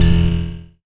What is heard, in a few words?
Widget Impact